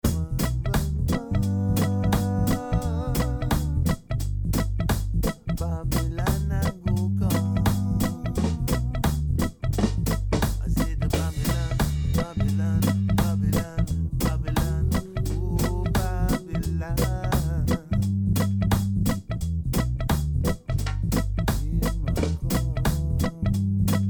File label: Sample Reggae Before